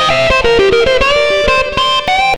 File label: xtra lead